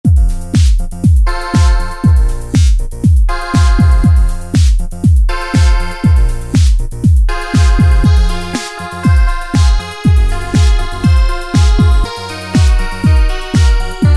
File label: Analog World, Alesis Micron; Factory Setups